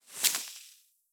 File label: Free Spins Contdown; fruitblaze; netent